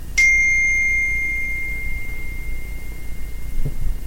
Note Sound iPhone

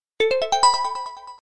Notification

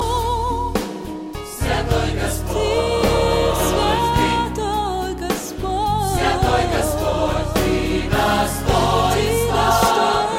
russian christian

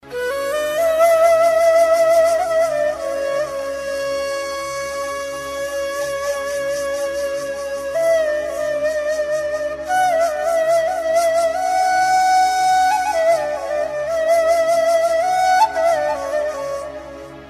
arabic nai; classical

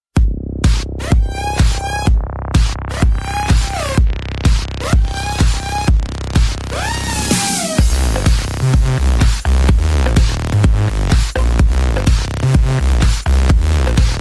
3d bass; step up; electronic

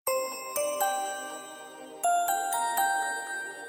Twinkle Sound

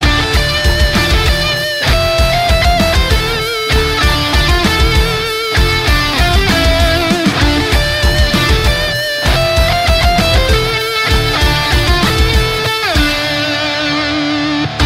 Metal

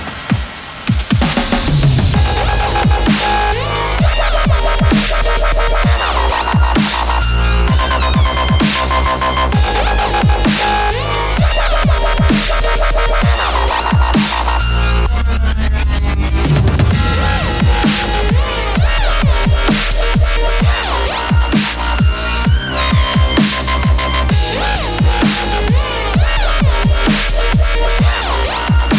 dubstep

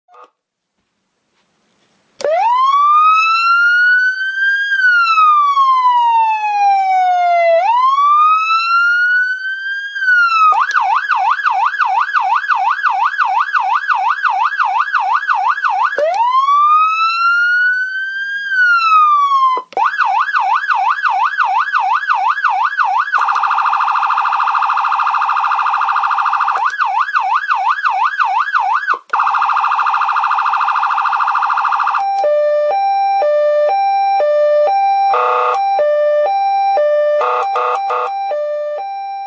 signal vehicle products ss670 siren amplifier

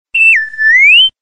R2D2 Notification

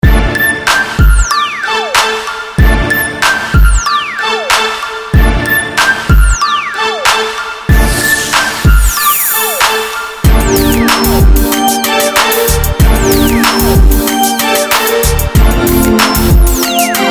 hip hop piano

